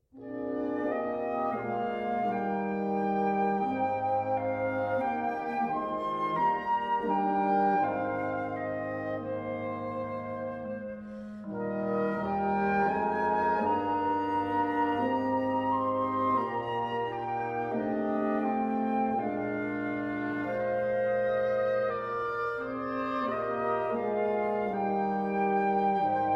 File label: Irish Tune; Grainger